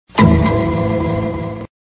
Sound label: doink; law and order